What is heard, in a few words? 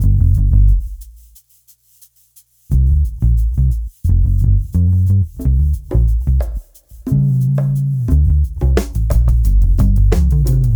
fresh grooves oldskool sound; fresh grooves sample pack